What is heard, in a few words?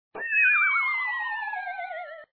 fall